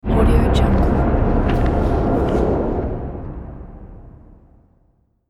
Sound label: spellbook impact